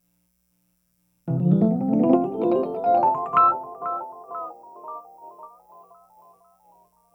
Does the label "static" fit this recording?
No